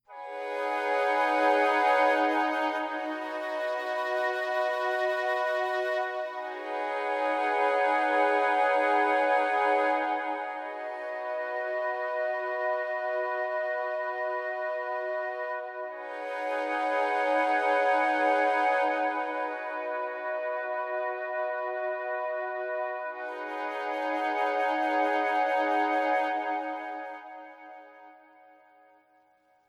flute drones; chromaris

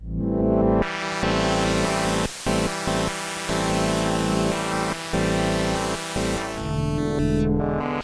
C pulse

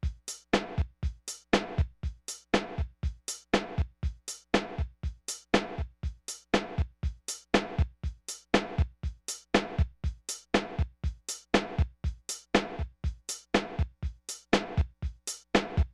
alive beat 120

